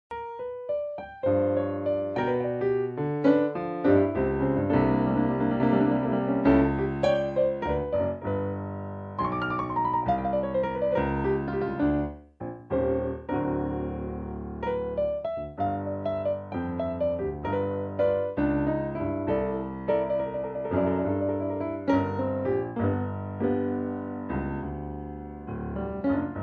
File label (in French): blues solo